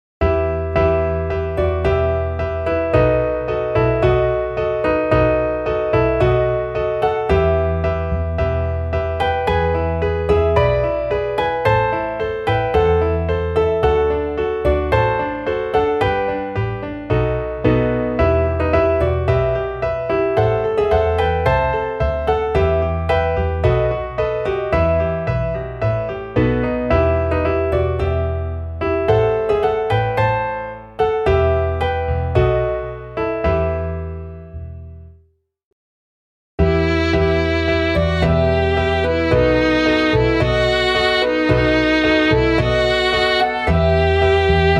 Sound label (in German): klavier; walzende gesellen